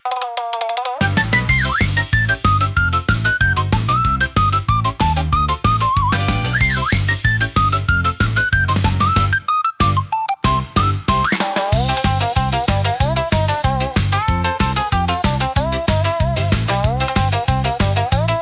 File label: instrumental